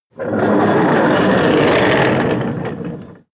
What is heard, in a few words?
slide wooden door short